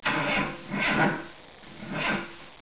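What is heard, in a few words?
dead battery; 12000 Graphics Pack - A Complete Graphics Collection For Your PC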